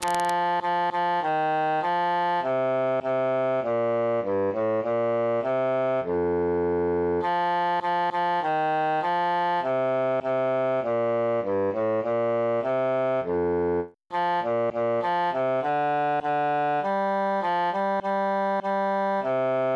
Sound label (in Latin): bassus solo; matona mia cara